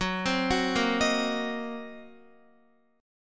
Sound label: Gb 6th Flat 5th Gb down Guitar; arpeggiated; Gb6b5; JGuitar